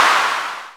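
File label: percussion clap, Analog Drums; Roland - Rhythm Section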